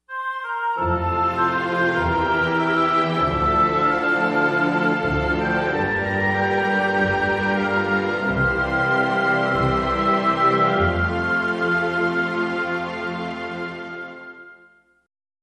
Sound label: Corporate Caring, generic corporate music